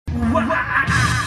wahahahah; cave

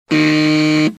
wrong

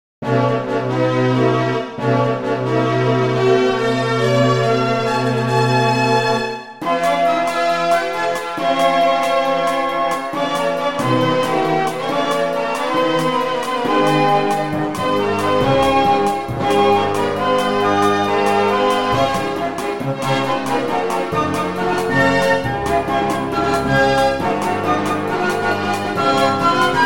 orchestral

